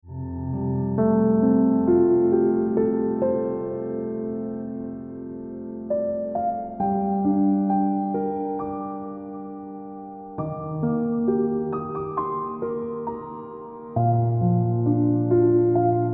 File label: Ringtone